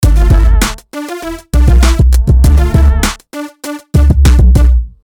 Heavy Hip Hop

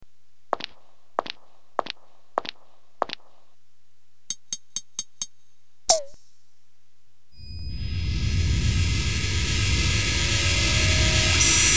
walk up tap run

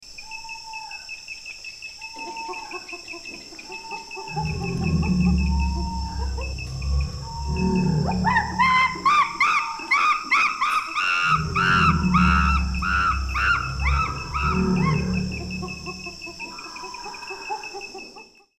jungle scene chimp

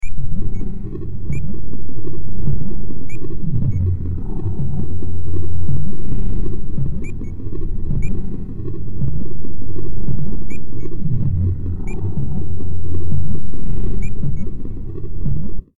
Science fiction

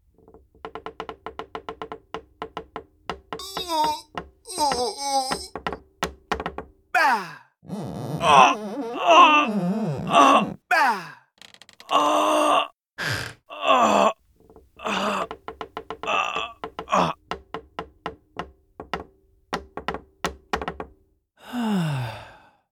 stretch groan

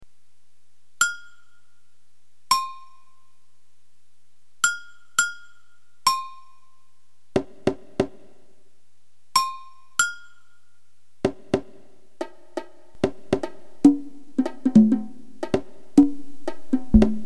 I play percussion

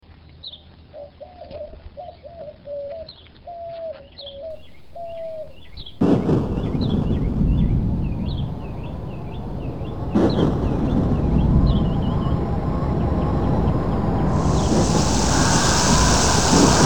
storm come storm go

